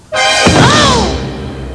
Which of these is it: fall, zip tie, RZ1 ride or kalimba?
fall